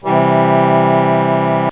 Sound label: triad